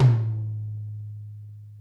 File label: tom, drum kits